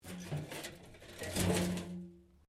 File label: Pipe Tin Move; Material components to create more complex sounds; Materials; Metal